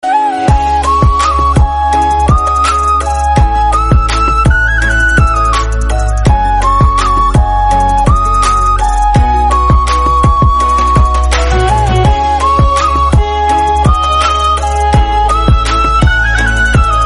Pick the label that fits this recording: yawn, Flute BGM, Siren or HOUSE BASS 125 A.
Flute BGM